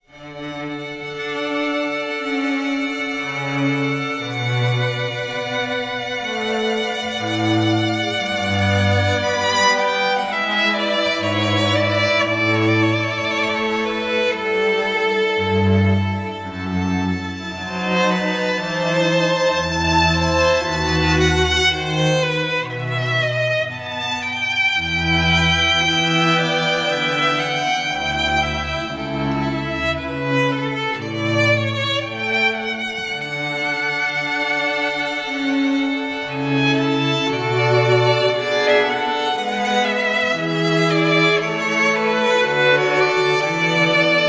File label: Air from Orchestral Suite No.3, quartet; J.S. Bach